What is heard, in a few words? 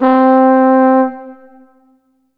Ensoniq SQ-1 Trombone C4